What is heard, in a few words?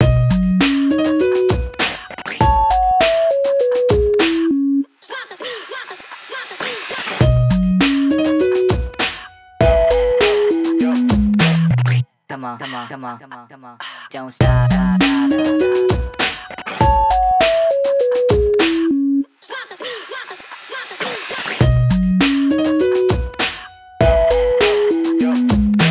Loop; Friday Night Funkin': The Origami King